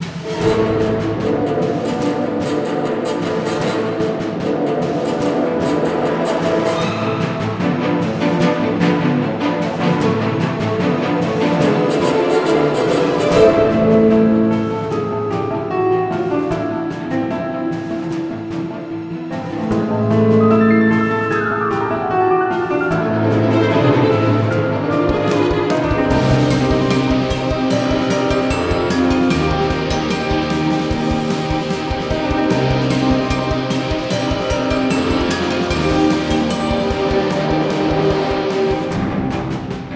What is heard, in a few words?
Boss Fight Loop; windscape switch windows xbox one gamerip 2016